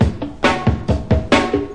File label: 137 bpm whose gonna help, drum loops and breaks